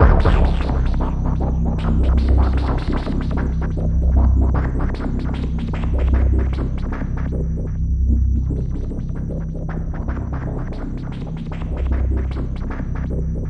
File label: C1, SynthLine; NiceBeats